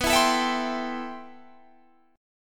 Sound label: A-Minor 7th B; Am7/B; strummed